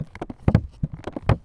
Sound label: Muted Rolling; BB Retail Therapy Sample Pack